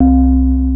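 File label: Electric Keyboard; SPCTool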